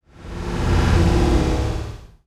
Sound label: Transition Whoosh Medium; Production Element